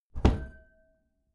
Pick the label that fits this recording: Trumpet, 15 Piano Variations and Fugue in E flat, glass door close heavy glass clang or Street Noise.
glass door close heavy glass clang